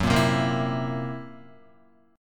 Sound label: F sharp 7th Flat 5th Guitar, F#7b5 chord; strummed; JGuitar